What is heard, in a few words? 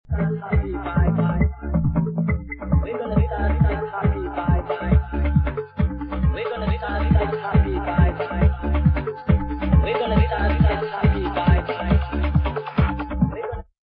happy vibes